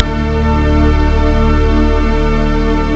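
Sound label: pad major; jazz jackrabbit 2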